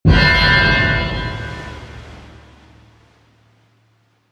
Trailer Hit Wind Hammer; Trailer Sound Effects

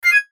chime light; Chimes; Multimedia; Blastwave

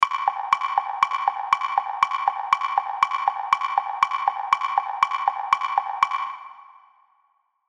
Cartoon Wood Block Clip Clop Tick Tock; Musical

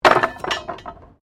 Wood Metal Move; Material components for constructing more complex sounds; Materials

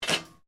METAL SCRAPE SMALL; Sound Effects:Materials

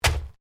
Organic stab; Various Organic Foley sounds; Stabs and Slices; Combat

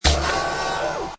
Hi Tech Panel Servo Button

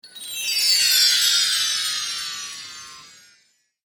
Santa Sleep Spell, magic

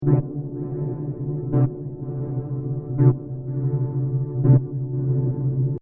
Scifi SFX Loop, Short; Stuart Duffield

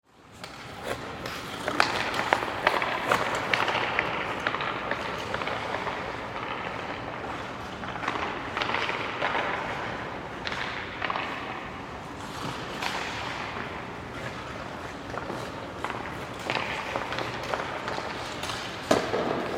Sports Hockey Practice Ambience Two Players; SFX Bible 6

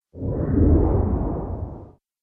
Underwater Element, Water; nature

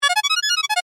Multimedia Chime, Technology, Multimedia, Chime

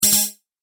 Double Beep Twang, ring; electronic

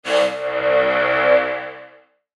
Mouseover Hit To Musical Whoosh; Multimedia; Blastwave

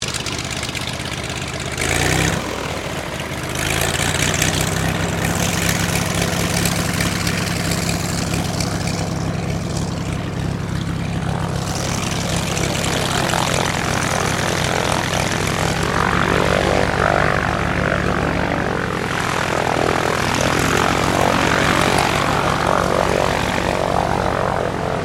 AIRCRAFT CT4A TAXI, military trainer aircraft; Airplanes; Vehicles

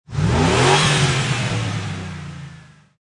Truck 4.7 Liter Rev Engine Perspective; Auto, truck, motor. 4.7 Liter Truck / Lorry. Rev engine one time, engine perspective.; Car Sound Effects

